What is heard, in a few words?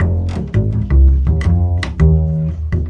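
acoustic bass jazzems; bass loops; melodic loops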